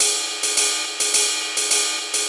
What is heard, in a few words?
Ride 105, Perc Loops, Old Skool House